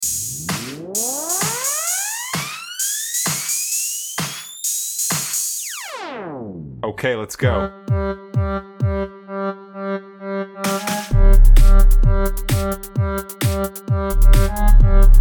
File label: Brazilian Beats